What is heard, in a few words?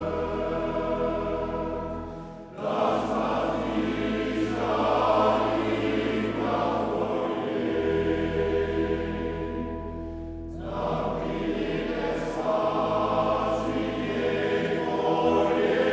Dutch male choir, hebreeuws